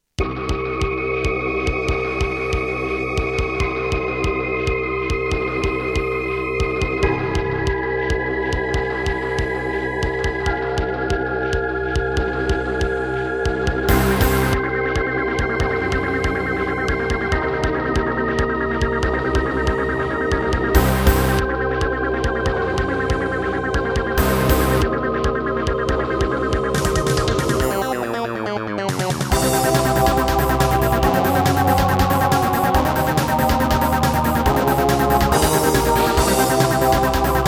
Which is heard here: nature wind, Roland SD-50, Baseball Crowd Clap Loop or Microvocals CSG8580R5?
Roland SD-50